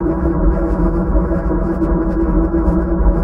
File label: Infected tree; spaceship